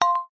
multimedia alert chime short notification; zapsplat

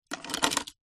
leisure toy cars metal x3 pick up from table

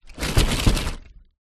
leisure toy cars in cardboard box movement

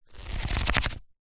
household book pages flick fast; zapsplat

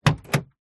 car door open volvo 850

